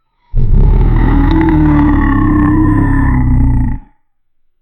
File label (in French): fais un cri dune bete, dinosaur